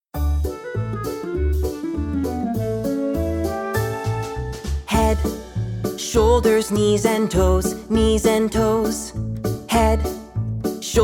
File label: MUSIC